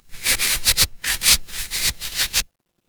paper fabric fabric

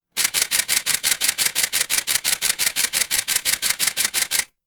office camera

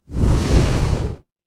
magic scifi rocket

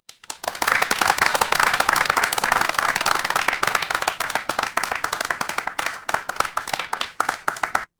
crowd applause

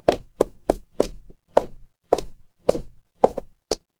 footsteps heels

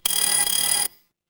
office alarm clock

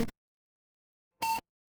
retro game combo

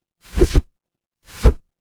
transition swoosh

